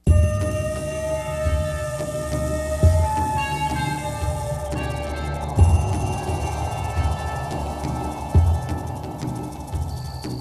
music; singe; Maddog HD

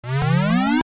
reload; singe; Space Pirates HD

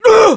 damage; mohaa; dialogue

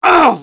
damage; mohaa; dialogue

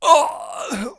death; mohaa; dialogue

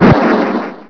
powerline; hurrican

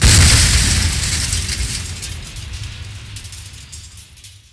truck crash end, vehicle; mohaa

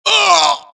death, Axis; mohaa; Mission 1

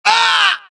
death, dialogue, Axis; mohaa; Mission 1

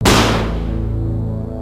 death; singe

